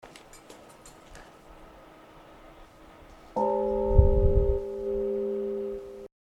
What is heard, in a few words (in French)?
cloche